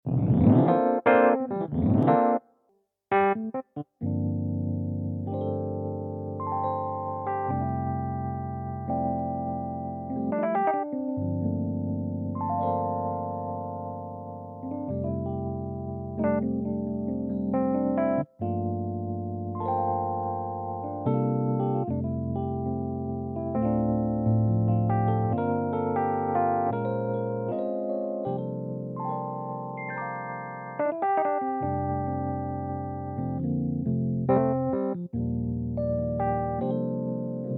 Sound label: Roland GP 3 Stage EP